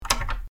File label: lock wood door